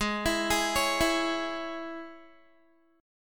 G sharp Suspended 4th Sharp 5th G sharp, Guitar; arpeggiated

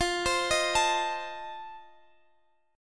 C Minor 6th, F, chord; arpeggiated; Cm6/F

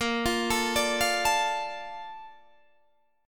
A sharp Major 7th, Guitar DADGAD

